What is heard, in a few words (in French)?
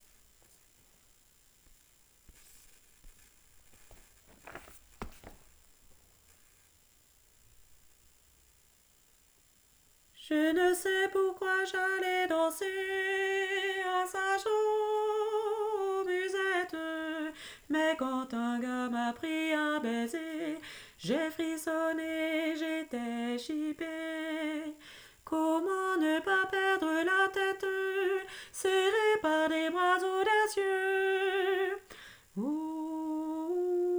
alto